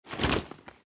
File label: Military equipment movement; military equipment sound effects